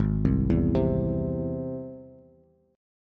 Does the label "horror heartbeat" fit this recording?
No